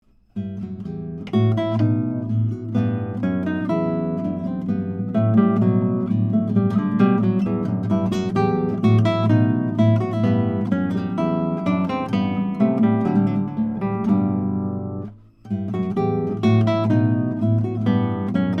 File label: Bouree, Neumann U87Ai